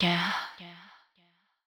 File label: VOX